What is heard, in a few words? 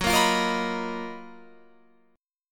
F sharp Major 13th Guitar Open F; F#M13 Chord; strummed; 18,17,17,17,18,18